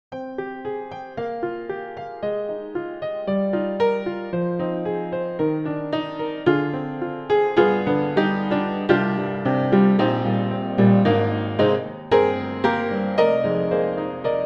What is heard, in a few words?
Upright Piano; Native Instruments; Kontakt Factory Library(Band)